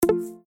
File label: widget Opened